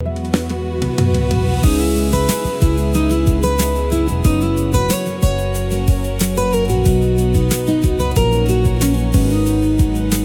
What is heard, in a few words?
loop